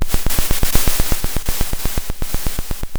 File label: Sound of a drum roll